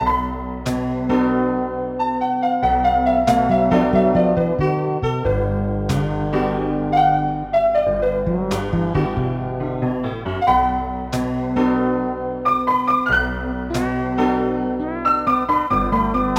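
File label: background music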